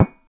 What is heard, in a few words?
mug pop; Sound Design Tools; Raw Foley